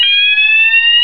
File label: fire medium sweep, fire alarm; garrysmod